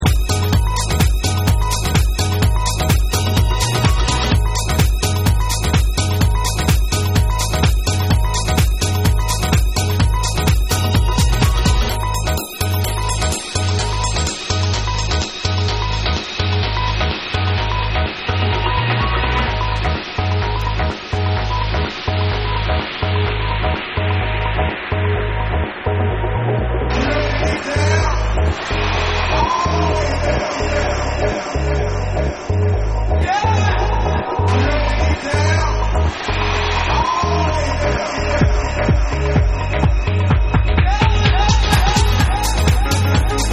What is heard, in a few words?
Feel Good Piano Groove